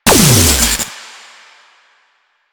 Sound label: Antimatter Drop Fully Charged; Warframe; Warframes